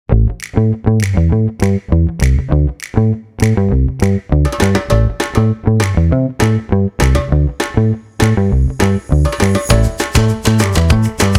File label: Upbeat Funk Pop; Energetic and catchy with elements of funk & pop.